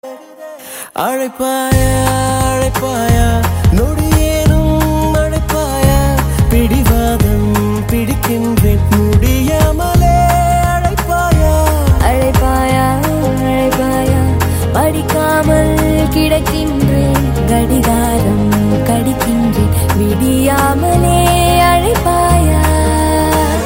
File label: tamil, dance